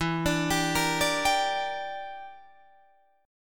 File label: E Minor 7th Flat 5th E, Guitar Drop D